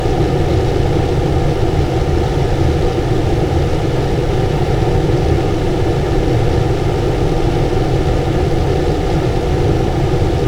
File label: large vent